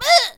Painter Death Vocals

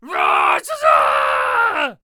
Headbutter Attack Vocals

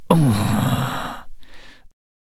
Death Vocals; Deadeye

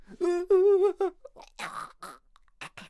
Ice Archer Death Vocals

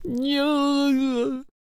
Death Vocals; Chariot

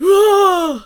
Harvester Attack Vocals

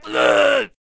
Spear Thrower Death Vocals

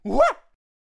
Monkey King Attack Vocals

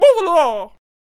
Attack Vocals; Potionseller

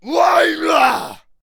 Berserker Attack Vocals

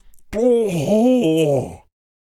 Zeus Death Vocals

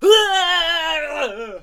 Musketeer Death Vocals

